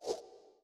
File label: left, uisounds